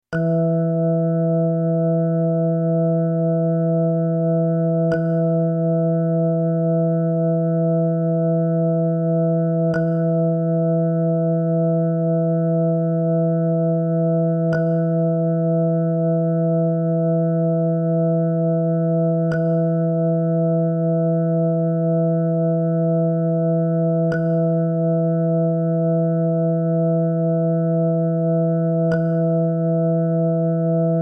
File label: Tuning E Jazz Organ Sound